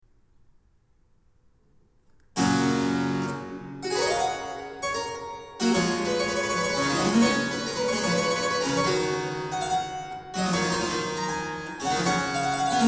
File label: Goldberg Variations, Variatio 16. Ouverture. a 1 Clav, Harpsichord; Bach, J S; BWV 988